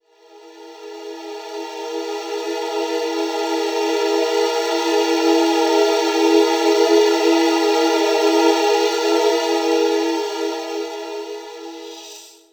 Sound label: Atmos, Atmospheres; Akai; Mule